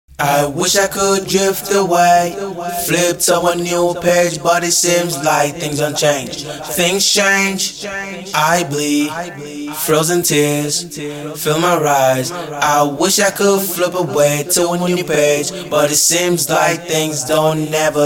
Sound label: chill out 90 Dm; acapella